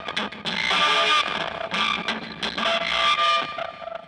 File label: Burning Guitar